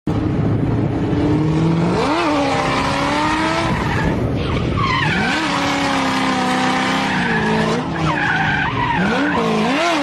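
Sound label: Pontiac, exhaust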